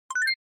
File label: Short Accent Dry